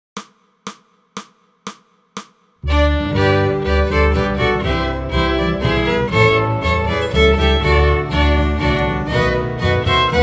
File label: Bass All; christmas; We Wish You a Merry Christmas